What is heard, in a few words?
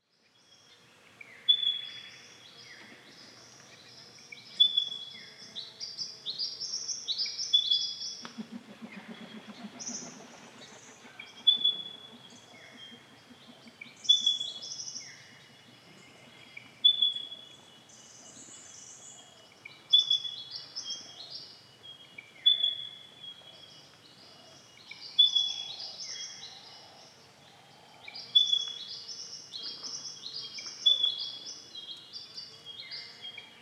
Pardalote, پرنده